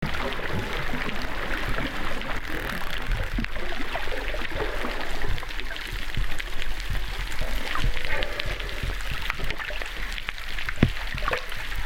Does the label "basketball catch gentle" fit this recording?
No